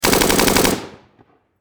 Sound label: Warfare Machine Gun Bren Lmg Long Burst Close Perspective; SFX Bible 6